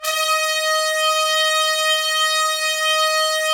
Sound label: Trumpets; Roland - LA Composer vol. 4